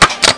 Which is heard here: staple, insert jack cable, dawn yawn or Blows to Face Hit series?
staple